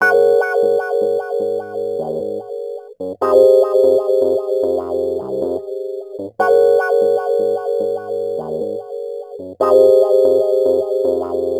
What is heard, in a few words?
Rhodes Attacks; Ueberschall